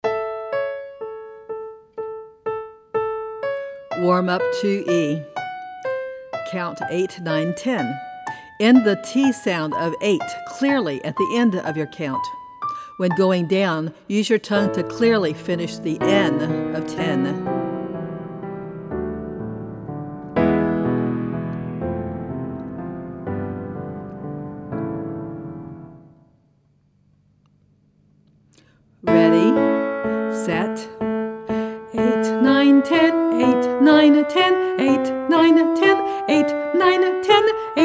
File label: Vocal Warm Up Count